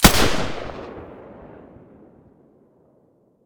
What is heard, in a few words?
silencer g36 stereo, weapons